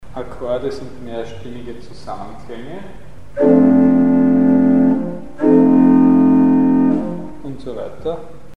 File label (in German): Akkorde; Mikrobuch